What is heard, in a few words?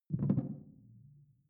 sci-fi alien bounce; swooshes-swishes